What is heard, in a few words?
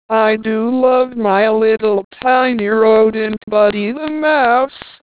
sing mouse